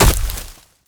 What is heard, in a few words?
step; gonarch